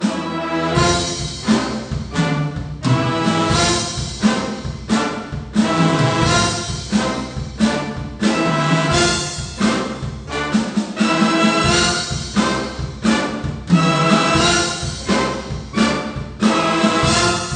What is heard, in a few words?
Menu Song; NCAA March Madness 98; PS1